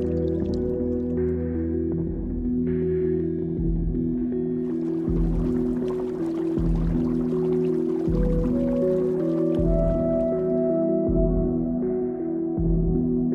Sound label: Main Theme loop